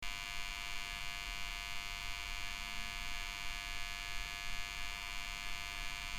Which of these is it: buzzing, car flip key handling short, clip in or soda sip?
buzzing